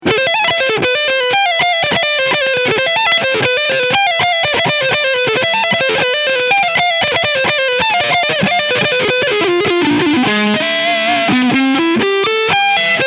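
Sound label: Sabre Shredding Neck PU Humbucker Mode; Sterling by Music Man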